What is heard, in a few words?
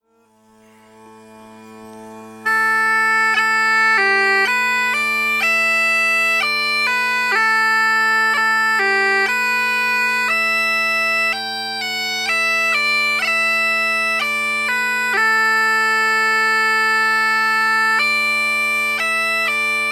WONDROUS LOVE melody only